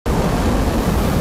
Firethrower

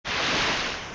swim; super trench attack 2

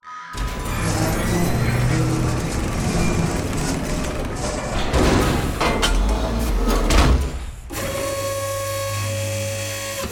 Claw Sound; Poppy Playtime

